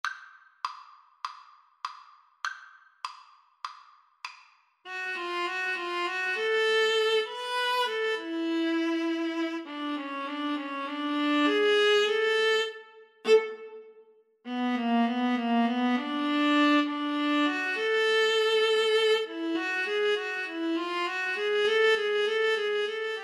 viola, Viola Duet

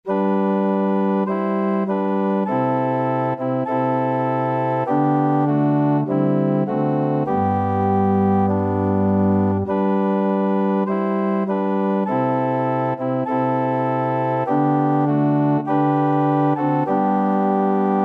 organ

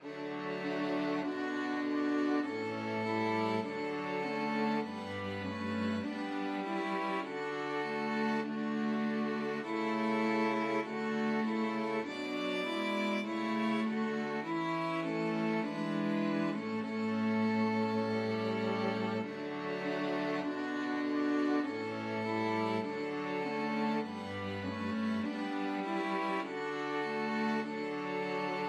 string quartet

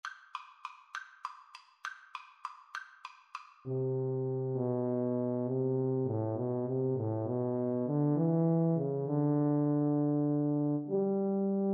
tchaikovsky waltz, Tuba Duet

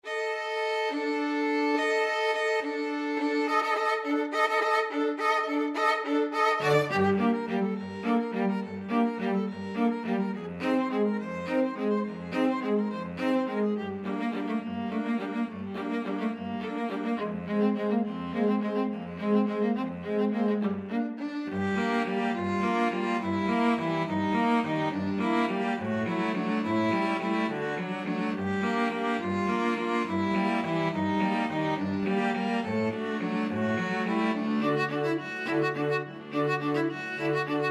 string trio

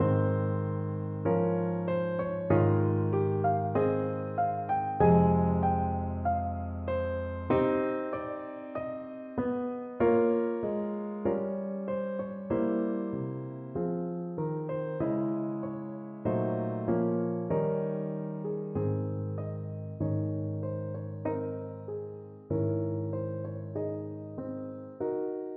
trysting place, piano

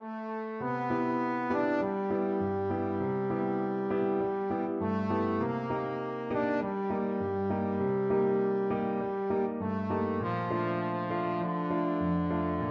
trombone

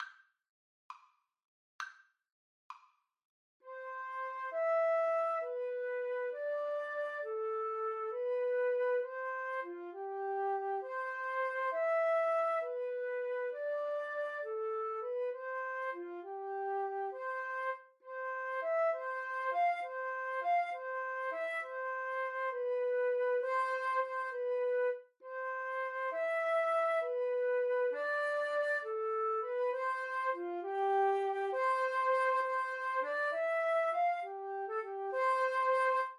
Flute Duet

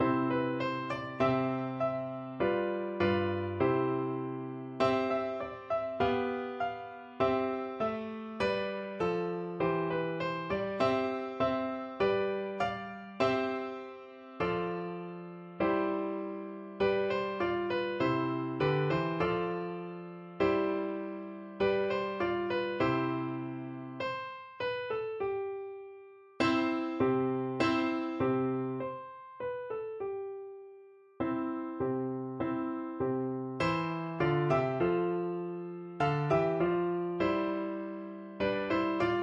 nkosi sikelel piano

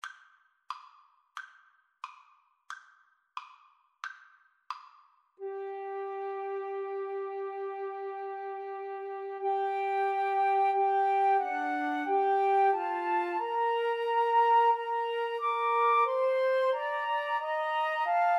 Flute Trio

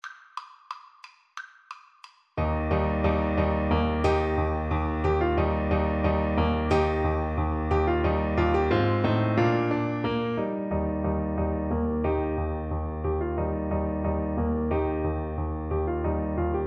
Baritone Saxophone

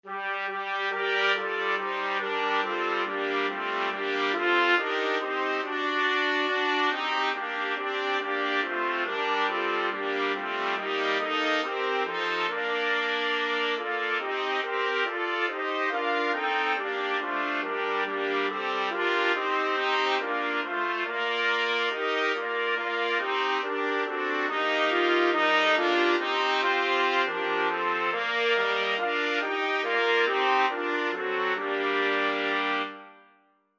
god rest 4TPT, trumpet quartet

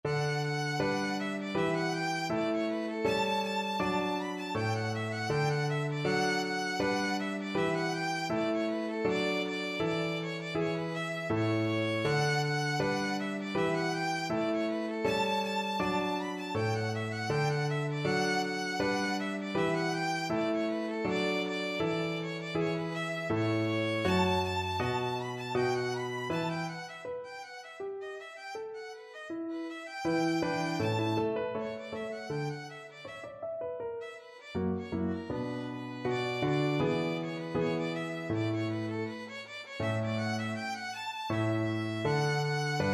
gavotte cello suite violin; bach

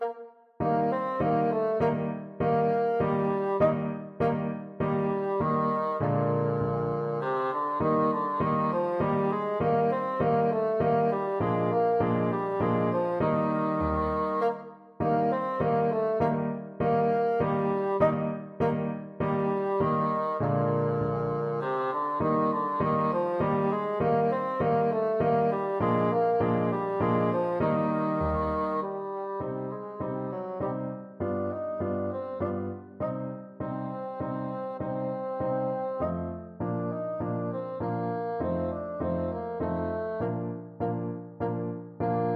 german dance, bassoon

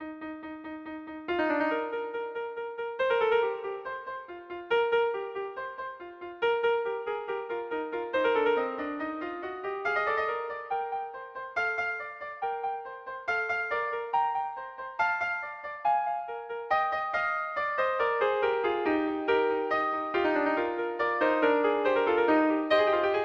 Overture, wind ensemble; Magic Flute

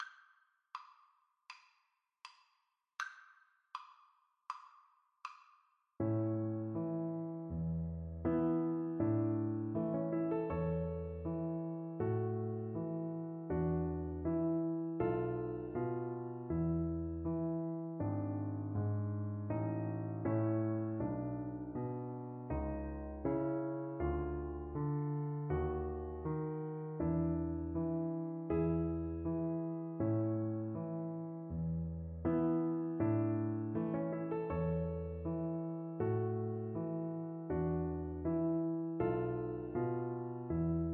reed pipes, violin

